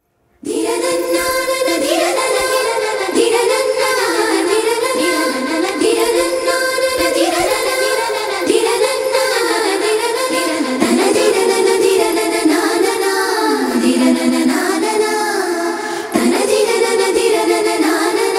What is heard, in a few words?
love bgm ringtone; acharya